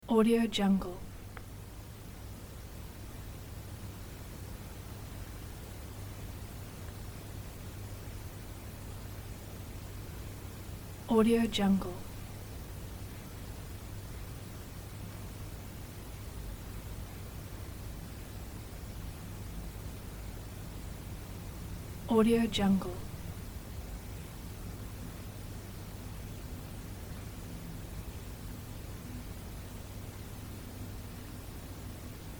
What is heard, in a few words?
room tone living room tightly furnished; urban sounds